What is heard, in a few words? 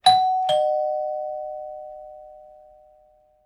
doorbell